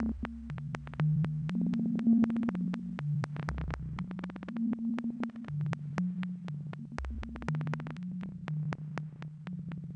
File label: eurorack